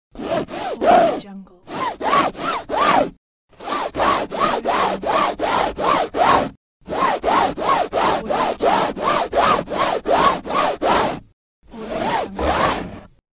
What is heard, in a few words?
fabric friction